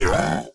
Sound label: hurt, vindication illager; PureBDcraft; minecraft